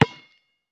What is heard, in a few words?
Street Ball Bounce Concrete; SFX Kit